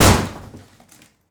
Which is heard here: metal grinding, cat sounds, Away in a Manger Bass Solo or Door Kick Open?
Door Kick Open